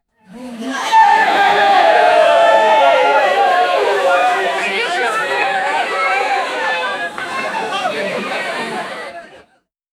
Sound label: birthday party